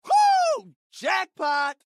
jackpot; lucio

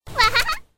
wahaha

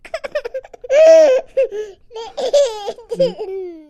toddler laugh; soundbible

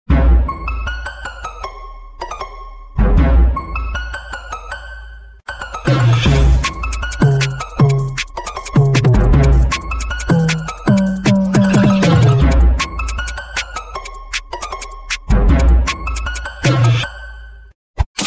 trap remix; amogus

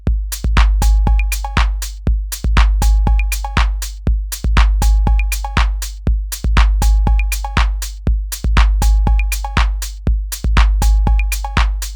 drumbit

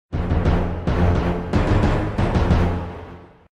Dramatic Accent

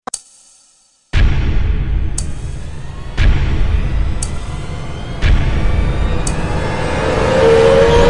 dramatic reveal

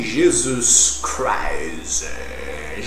hehe

